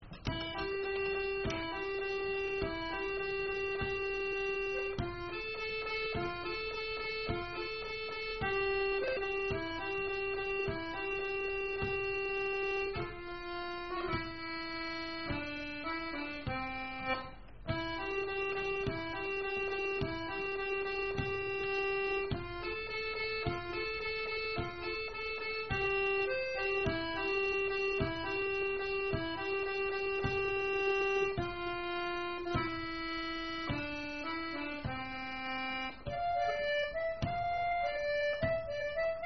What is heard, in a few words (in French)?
Polka (2ème voix); rencontres-2014